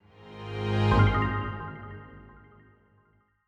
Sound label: New Game Short And Sweet